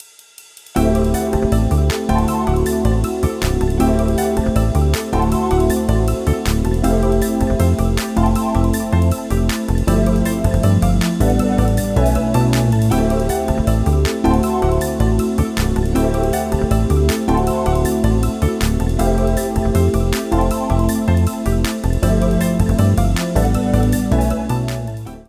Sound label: Choosing Character